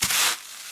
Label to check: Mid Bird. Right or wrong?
Wrong